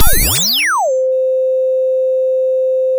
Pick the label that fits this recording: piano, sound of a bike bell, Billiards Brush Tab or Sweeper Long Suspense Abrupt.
sound of a bike bell